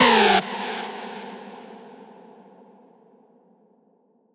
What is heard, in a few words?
synth screech oneshot E; Synth Hits; GhostHack